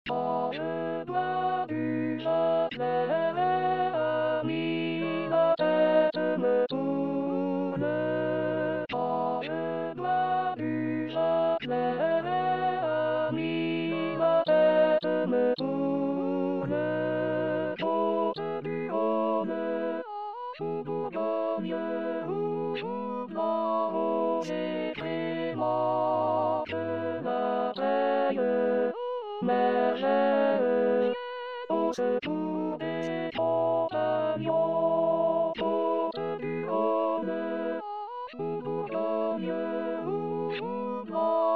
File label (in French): l harmonieux vigneron alto voix